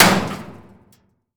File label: bullet metal auto, physics